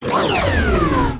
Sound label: science fiction robot power down